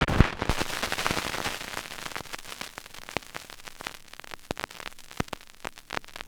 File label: record staticy; Rumbles